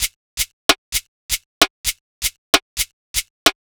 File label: Shaker and Stick 130 bpm; Loops; Drums; Percussion; Ableton Live 12 Lite; Core Library